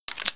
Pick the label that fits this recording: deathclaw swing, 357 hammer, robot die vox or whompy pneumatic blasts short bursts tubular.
357 hammer